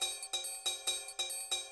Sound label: DRUM LOOP BIG BT 140; eJay; BigBeat